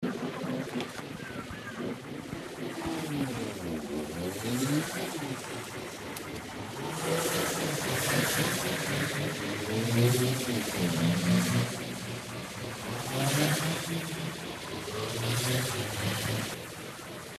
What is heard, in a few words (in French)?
course de voitures